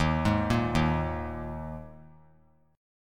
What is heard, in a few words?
D sharp Suspended 4th; D#sus4 arpeggiated; JGuitar